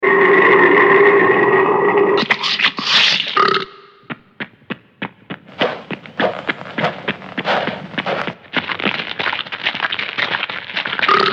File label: people